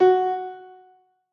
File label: Piani F#